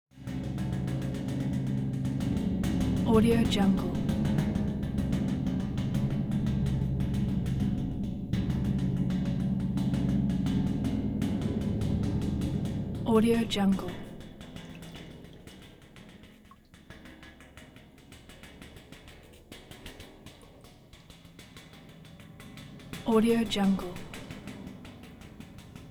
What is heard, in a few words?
underwater cymbal rapid